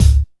KICKS

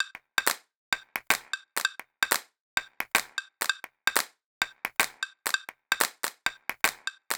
Bl-Cp Loop 130, Perc Loops 130, Loungin House; Equipped Music

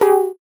VocoBit Hit, 8 bit; musicradar; 8-bit bonanza

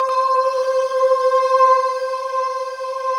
sweep C5; SWEEPHASE

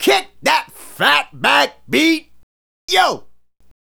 Fat Back Beat, Vocal Toolbox, Sound Bites; Sonic Foundry (Sony Creative Software)

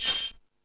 Multi FX

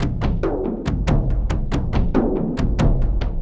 Chunka Dee Doo Chunka Doo Doo, Frame Kendang; Sonic Foundry (Sony Creative Software) - Friendly Fire; Drums; Long

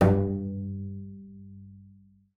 BING G2, BASS FINGER; Xsample VOL-5

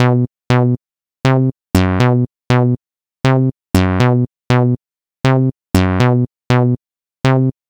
Stunner Stab; Sonic Foundry (Sony Creative Software); Club Joints and Street Anthems; Drop Top

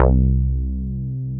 DANCE BASS; SYNBASS

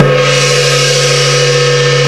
ORCH PERC; Rubber Chicken Software Sample Bank

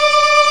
Organ, Barton Bold&Bras

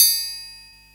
Triangle Open, Percussion Kit; Akai MPC2500

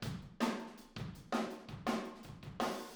Room, Drums, 162 BPM; Drums from the Big Room The Mixes; Take Your Toms Off; Verse

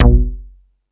Dance 2000, Drum Machines E-MU Pro-Cussion

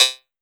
RIM, 160 BPM; Ueberschall; Drum & Bass Flash Trackz

